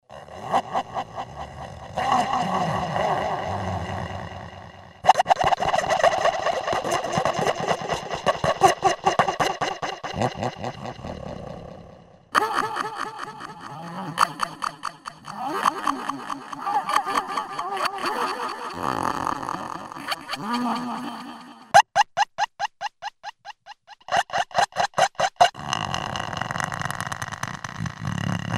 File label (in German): rillophon kurzimpro